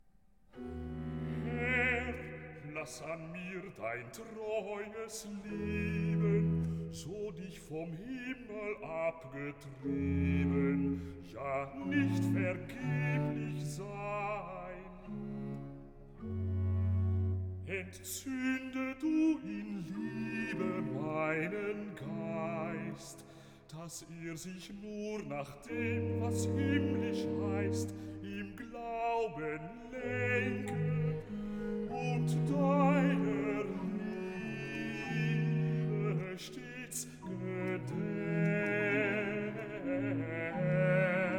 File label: Recitativo Basso; BWV 180; Schmücke Dich O Liebe Seele; KantateTrinitatisPlus20